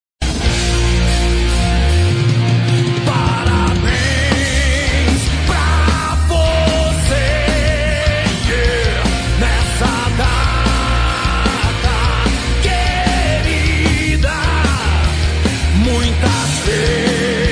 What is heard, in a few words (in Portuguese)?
Parabens Pra Voce Rock; Comemorativas; Aniversario